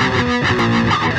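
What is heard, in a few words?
Guitar (metal); Sonic Foundry (Sony Creative Software); Ugly Remnants VOL-2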